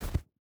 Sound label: footstep rubber boots snow jump land; Rust